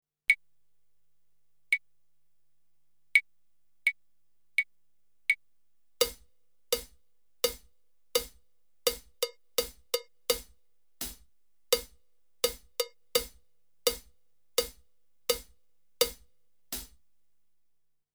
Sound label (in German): Rhythmus Schreiben